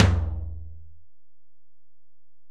Tom, Alesis DM-PRO, Drum Machines, Toms

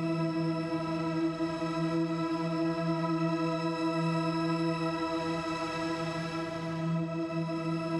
Drone; stereo toolkit samples; Non Tempo Loops

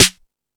Dance, Drum Machines

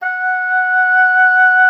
F#5, OB D.AMORE; Xsample VOL-7

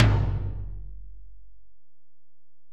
Tom, Alesis DM-PRO

